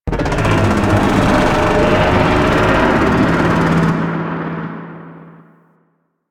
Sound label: Void Dragon Vocal Idle; Enemies; Vocals